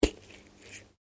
Stab organic wet, Created organic sounds; Stabs and Slices; Combat

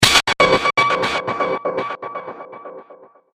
Production Element Chopper Rhythm Filter Hit; Choppers; Sound Design

